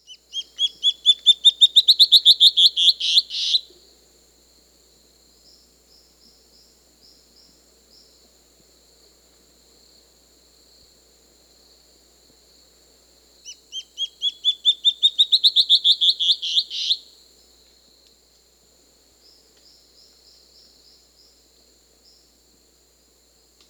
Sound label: Band-tailed Antbird (Hypocnemoides maculicauda), Peru; S. America